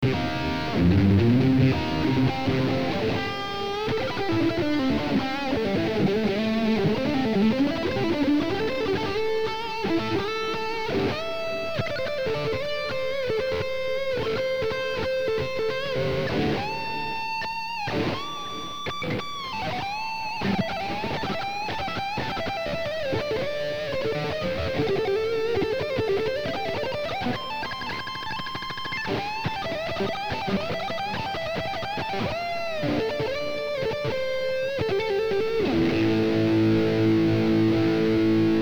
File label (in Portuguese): testando minha guitarra